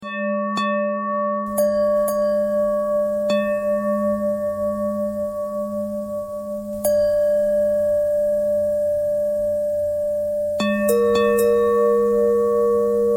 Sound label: bell thread bend thread